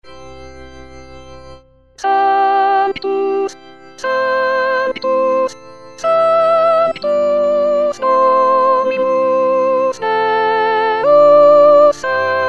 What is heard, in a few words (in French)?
soprano sanctus gounod messe; chapelles